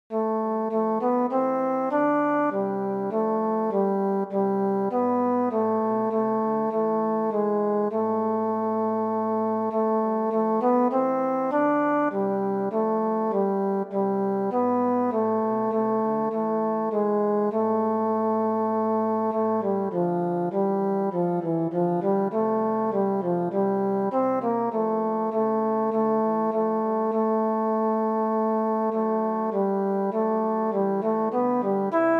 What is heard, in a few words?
tenor